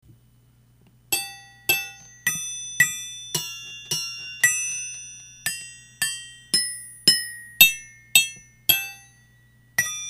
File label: shokki bell; 家中のスプーンやナイフを鳴らし、ド〜ラの音を探して、発泡スチロールのトレイにさして作ったのが写真の楽器です。; スプーンの柄で叩いて音を出します。; リサイクル楽器展示室V